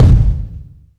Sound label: boomy kick drum f sharp key